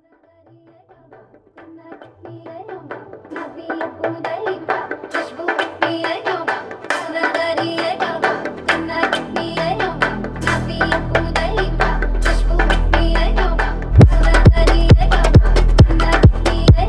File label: tribal